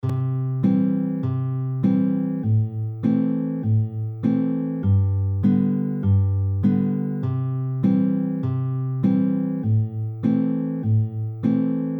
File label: 3 Finger Pluck, fingerpicking